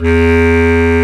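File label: bass clarinet, Orchestral Winds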